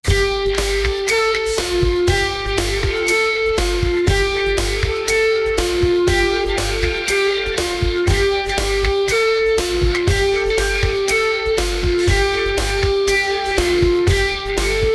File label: death metal song